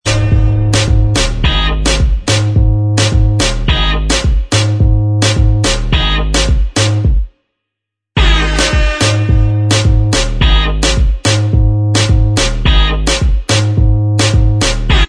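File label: instrumental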